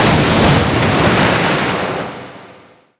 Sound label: gush projectile; tidehunter